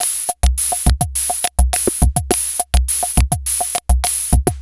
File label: Upbeat 104, Lounge Drumz